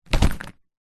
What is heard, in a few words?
luggage briefcase set down on suitcase